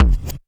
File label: Roland TD-20 Bassdrums